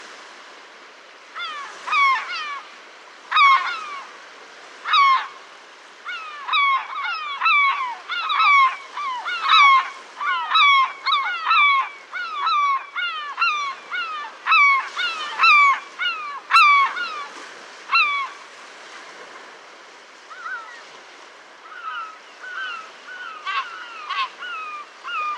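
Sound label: serebristaya chayka voice, herring gull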